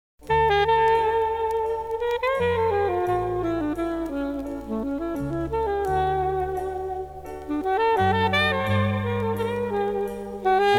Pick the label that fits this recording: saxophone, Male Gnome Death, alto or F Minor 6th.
saxophone